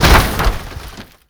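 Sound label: suburban crash impact distant; Call of Duty Modern Warfare; physics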